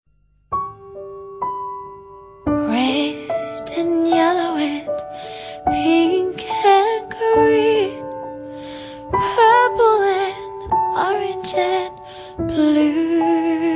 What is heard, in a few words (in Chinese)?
轻柔的背景音乐